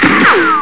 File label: KAPOW; WEAPONS